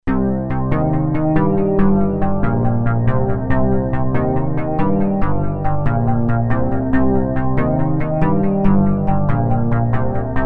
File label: MellowGroove; evolver; fdm evolver